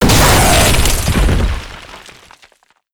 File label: engine destruction; damage; engines; radiant